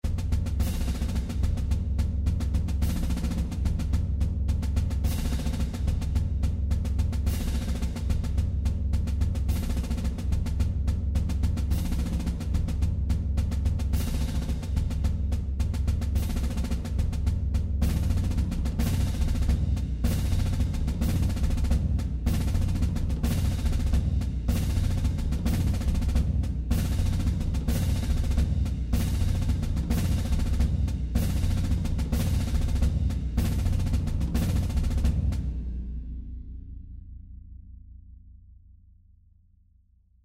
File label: cadence; burr